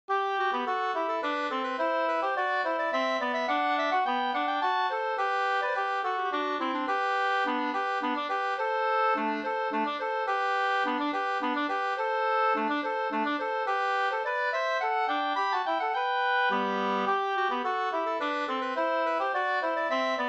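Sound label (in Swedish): slangpolska, two-part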